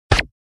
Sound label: Laser Gun shooting sound